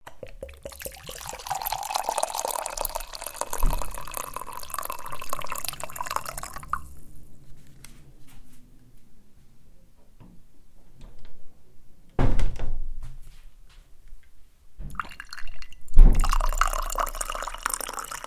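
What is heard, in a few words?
water stream high clear soft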